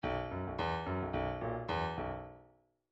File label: midi file grooves rock